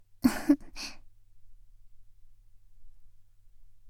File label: laugh, ASMR; Garden; WithRin-Nazo01